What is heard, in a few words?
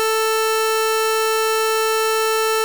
sawtooth comb filter